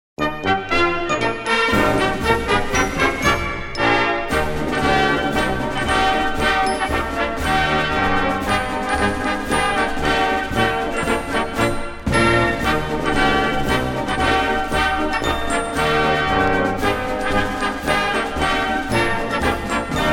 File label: jingle bells